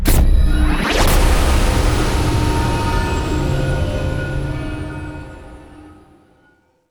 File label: Drone Attack Sting; Hemlock